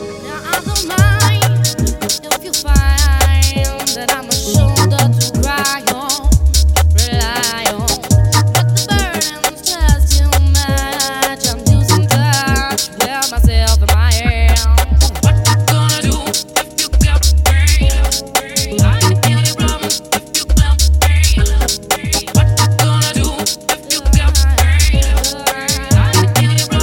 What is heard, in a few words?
Vocal